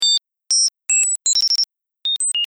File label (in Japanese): 人工メジロ; やっぱりデジタルだと空気感が...自然って感じがしない。; 鳥の声研究室; メジロ VS 人工メジロ; 音色もちょっと硬いのね。